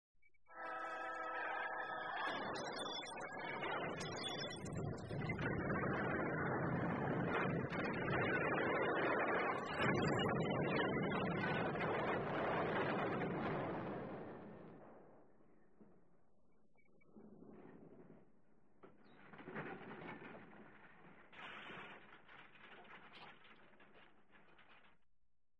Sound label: car accident; SOUND EFFECTS1